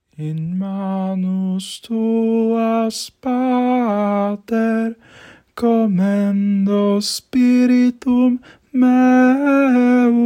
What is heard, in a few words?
Tenor, song; In Manus